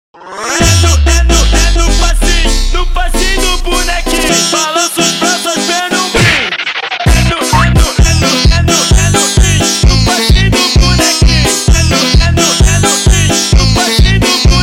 Funk

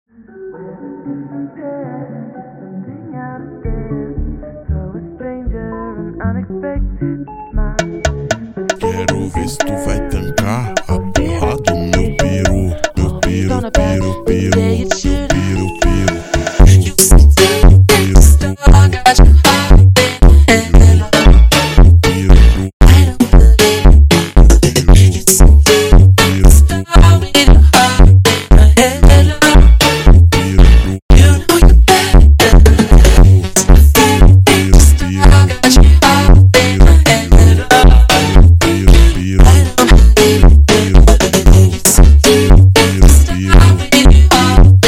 Funk slowed

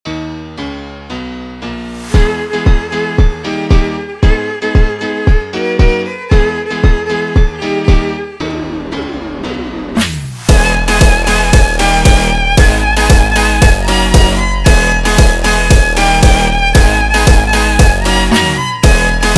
ultra Slowed

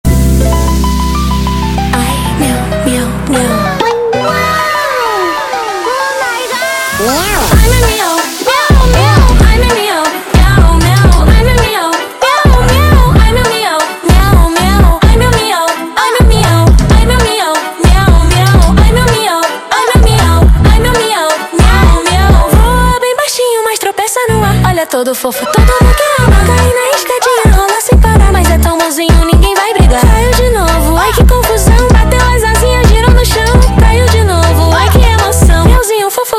slowed, funk

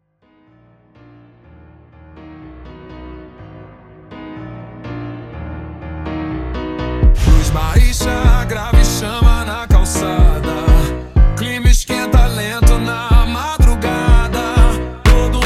slowed, funk

